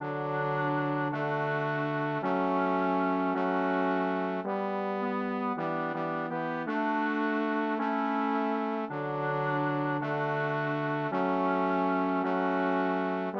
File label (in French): instr; louange